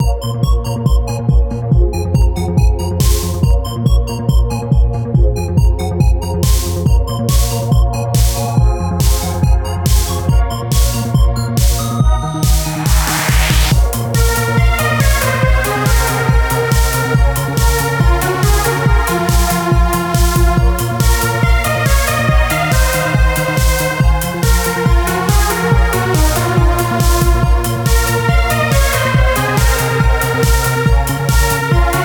Trance music with sky theme. This music is loopable.; bpm140